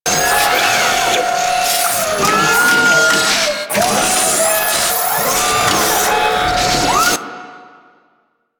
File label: Broken Display Loop; Warframe; Ambience; Nineteen Ninety Nine